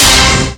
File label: extralife